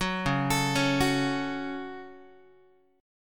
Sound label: C sharp Suspended 4th Sharp 5th, Guitar; arpeggiated; JGuitar